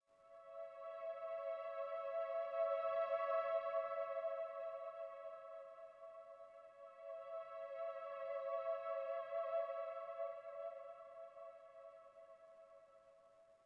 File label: Synth Pad; Plants vs. Zombies Battle for Neighborville